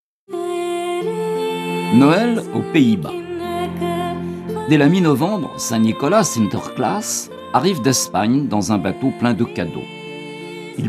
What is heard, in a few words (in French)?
NOEL PAYS BAS